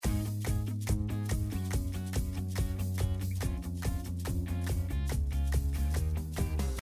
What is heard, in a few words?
level; Dora and Friends - Legend of the Lost Horses